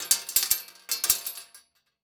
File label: Weapon Bullet Shell 3030 Shell Cases Multiple Cases Fall On Metal Ground; AdobeCC